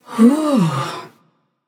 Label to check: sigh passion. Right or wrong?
Right